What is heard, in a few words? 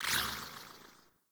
Tenno Weapon Hide; Warframe; Weapon Visibility